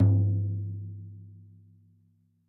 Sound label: tom lo, gretsch kit